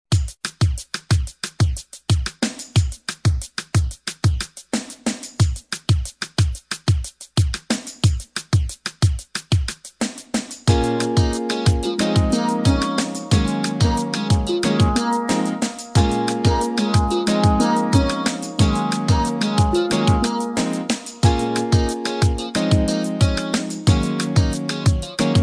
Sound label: steel and voices